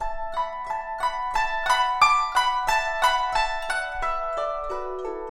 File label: Guzheng 90 bpm C